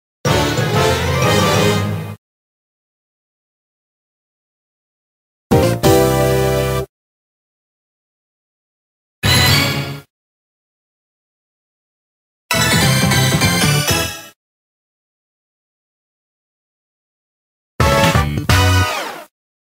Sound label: Fanfares Collection; Learn with Pokemon Typing Adventure